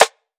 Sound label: Rimshot Snare; Drum One Shots; Snares; Cymatics; Cobra Hip Hop Sample Pack